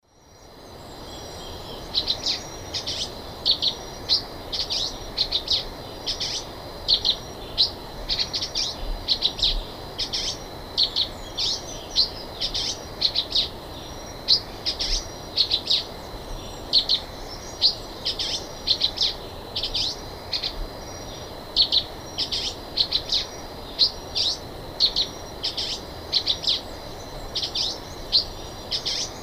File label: Ramphocelus carbo, song; S. America; Eastern Lowlands